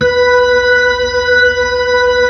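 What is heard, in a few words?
B-3, Hammond B-3 organ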